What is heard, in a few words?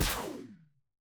retro game laser